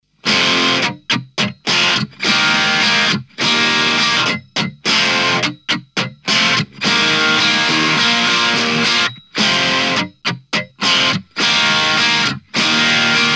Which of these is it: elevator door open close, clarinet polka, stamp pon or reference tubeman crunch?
reference tubeman crunch